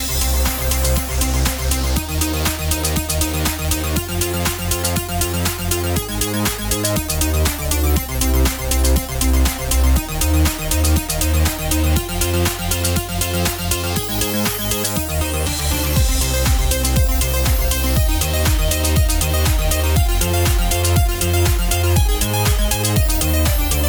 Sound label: freespin melody; Amatic; Fruit Box